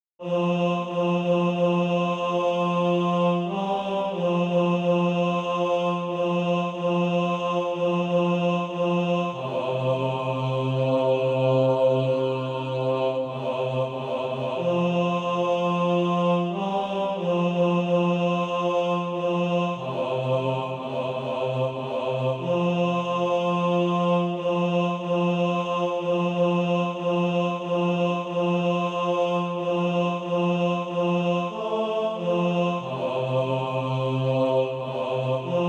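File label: bass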